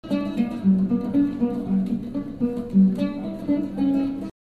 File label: loop